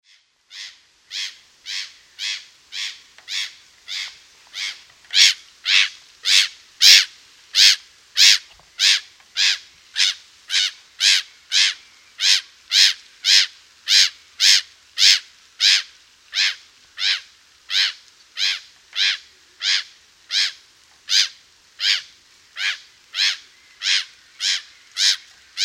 Gabbiano Comune

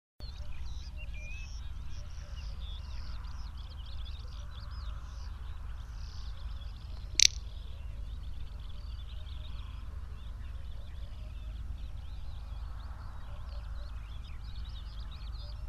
Gallina prataiola

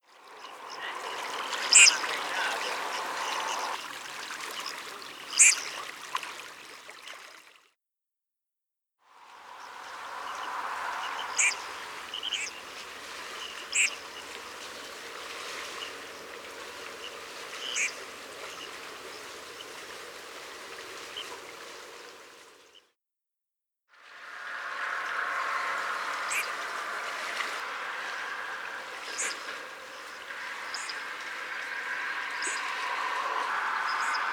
Fistione Turco

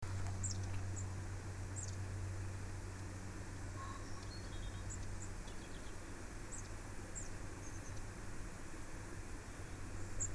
Codibugnolo

